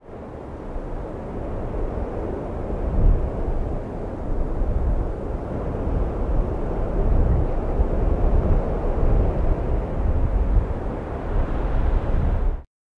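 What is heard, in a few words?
Ayers Wind left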